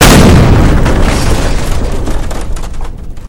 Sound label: explosion